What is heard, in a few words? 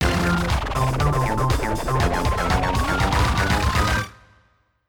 Jingle Speed Up - Level Up; WarioWare: Smooth Moves